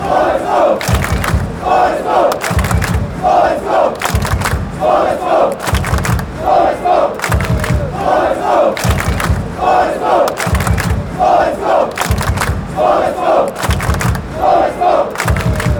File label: claps, fanchants